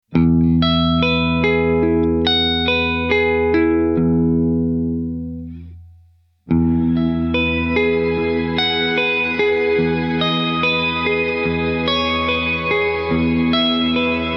Sparkle Sparkle Delicate, reverb

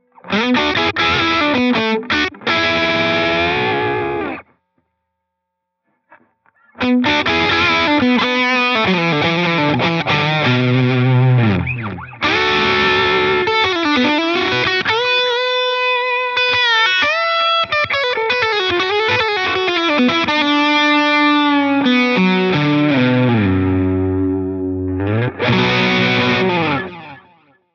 Digital Pristine, echosystem

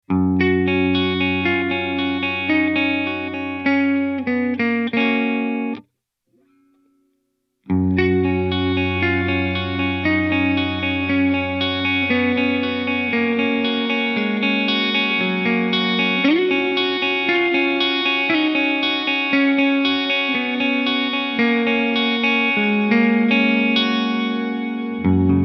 Sparkle Sparkle Understated, reverb

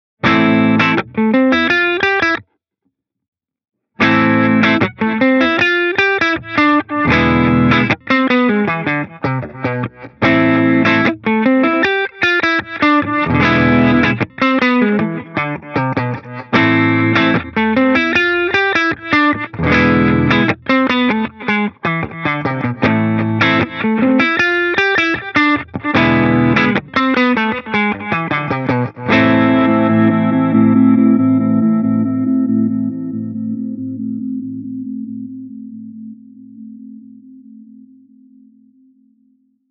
Reverse Triggered Reverse

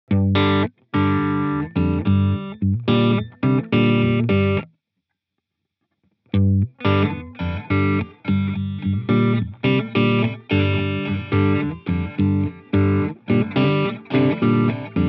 Mod Waveform

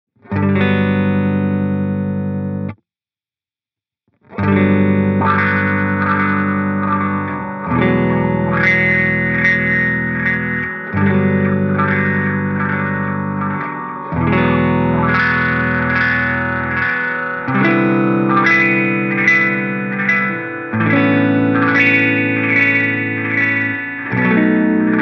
Filter Warp

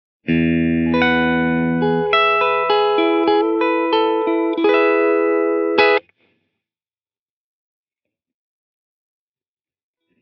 Reverse Reverse Dual Pitch

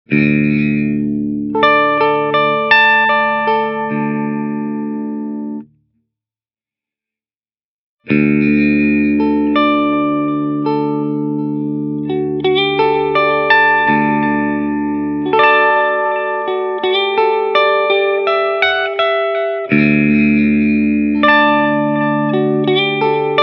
Multi Tone Taps

